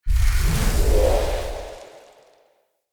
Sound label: Invis Cast; Fey; Saboteur